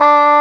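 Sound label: CORNET A4; AKAI S-Series CD-ROM Sound Library VOL-2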